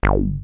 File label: wet bass, Yamaha CS-5